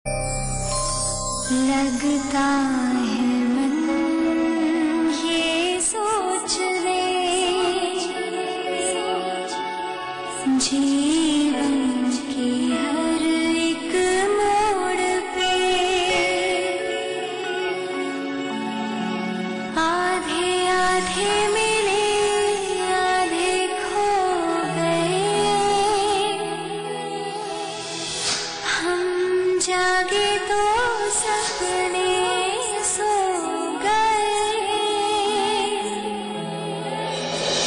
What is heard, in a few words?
Title Sad; Tv Serials; Zee TV; Choti Bahu Season II